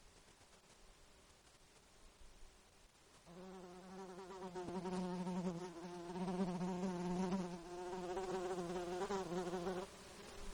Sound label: Mosquitos Close to Microphone; INSECTS; Alaska